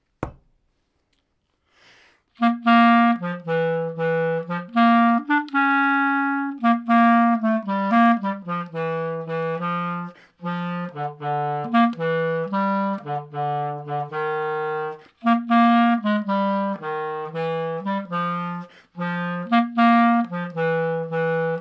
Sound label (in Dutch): Swingmars onderstem